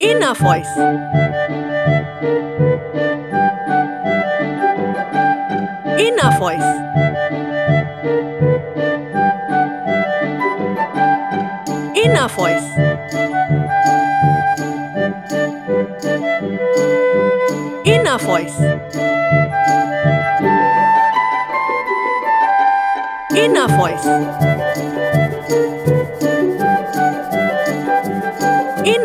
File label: comical corporate